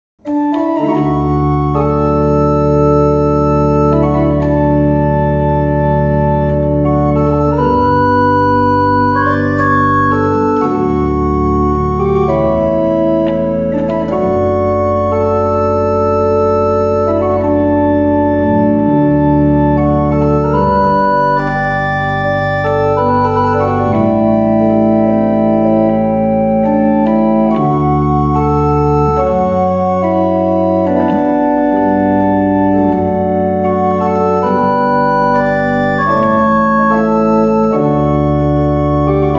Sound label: Jazz Pop